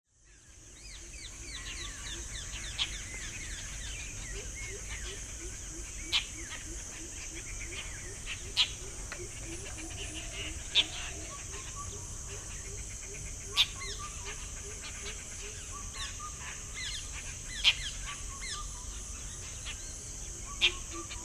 long lake chorus, nepal

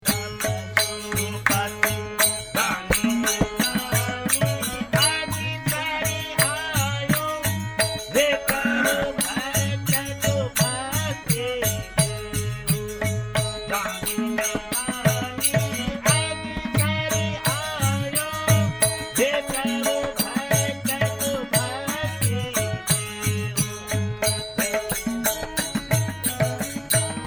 devotion, nepal

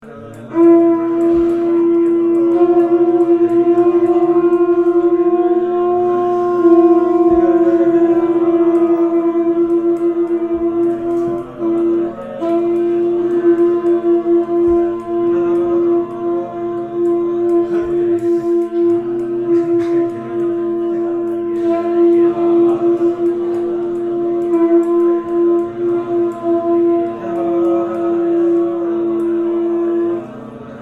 horns, nepal; bagarchap

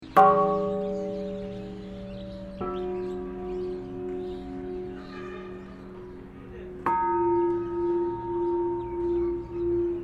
paya bells

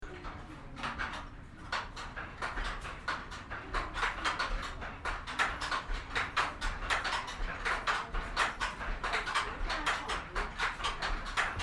silk looms, Burma

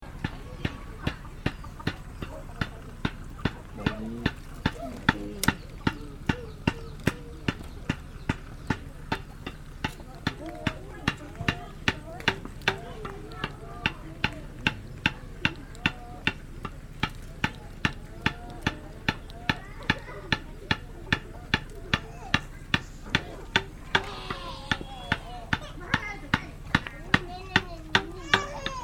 cotton whisking, laos; Farther / down the road, another woman beat and whisked cotton to prepare it / for spinning.

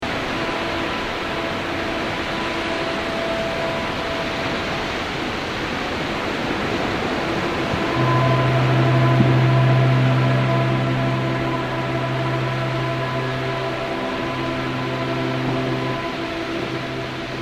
midnight horns

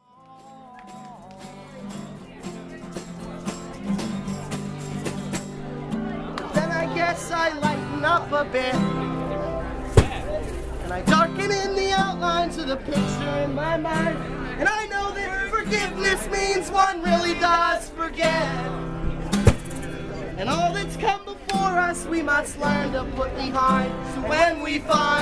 Brattleboro VT Beagle Club Band

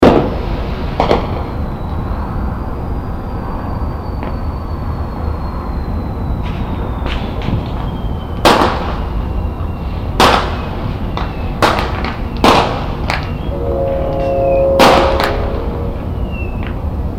oakland fireworks

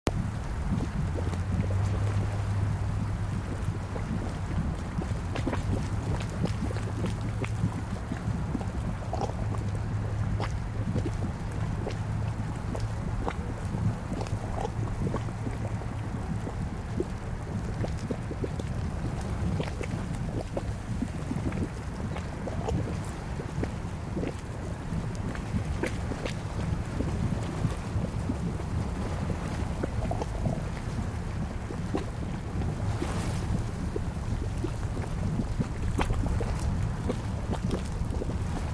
Yellowstone NP Steam Pots

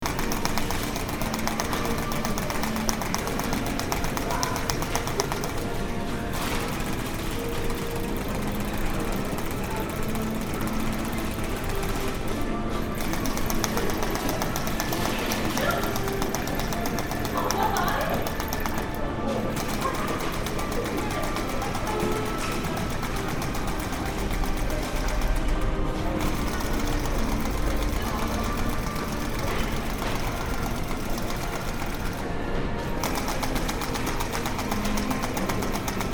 Hanada flights, japan

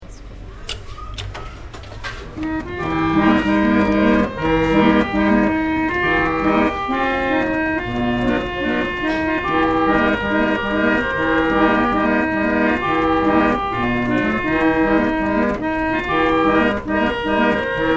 Musee Accordian

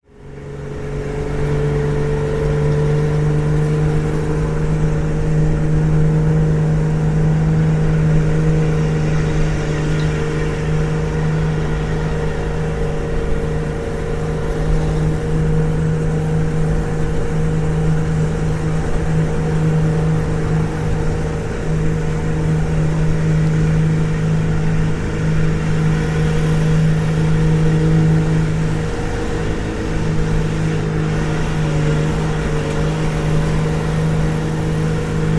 bus roof, nepal

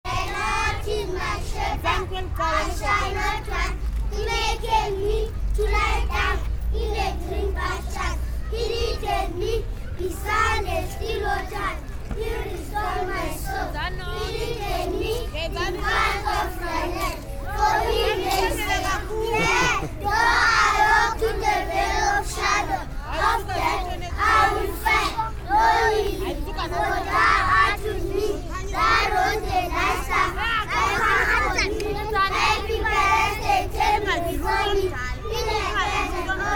prayer

